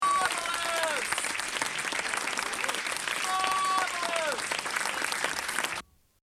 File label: audience appreciation; Audience appreciation (a few seconds)